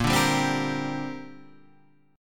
C 7th Suspended 2nd Bb

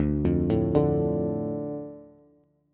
D Minor D Bass; Dm arpeggiated; 10,8,7,7; JGuitar

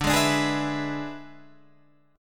E 7th D, guitar

